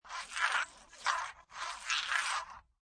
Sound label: CPU Voice